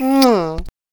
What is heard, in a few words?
kiss